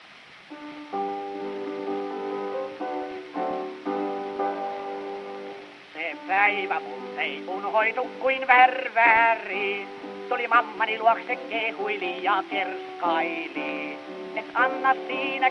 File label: Gramophone